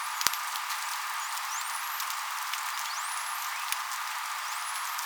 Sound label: Whistle